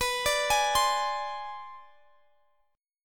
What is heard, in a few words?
B Minor 6th Flat 9th B